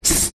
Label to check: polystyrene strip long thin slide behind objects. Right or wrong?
Right